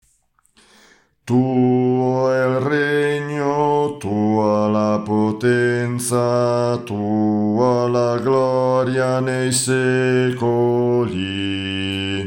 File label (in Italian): contralti; Tuo il regno